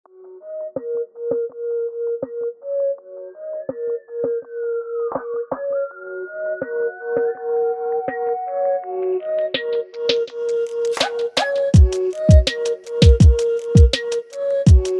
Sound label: beat